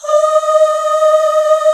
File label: MIDDLE OOHS